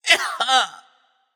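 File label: nonverbal ouch; smite; voicepack; merlin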